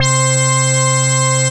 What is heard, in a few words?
Retro Organ, Synth Lead; Bass; Ableton; Creative Extensions